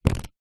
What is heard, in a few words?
leisure playing cards loose deck set down carpet